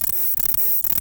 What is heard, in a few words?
song, katydids; neduba sierranus